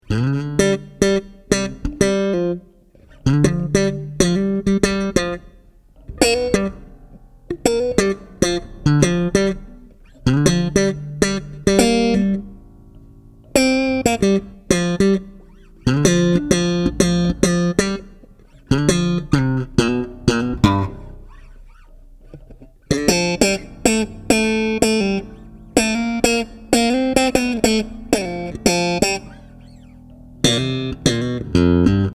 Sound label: slap solo, bass